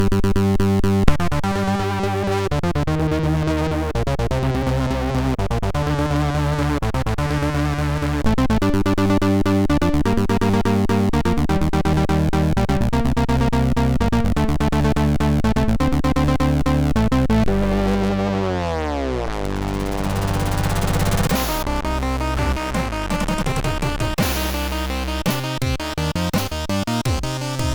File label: Remix sid MOS6581R3; hvsc